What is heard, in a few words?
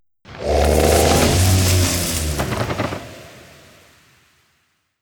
Treant Leaves; Treant Leaves All2